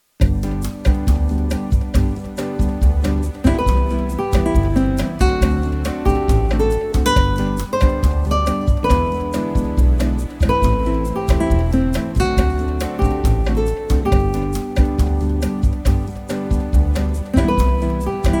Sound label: Bossa Nova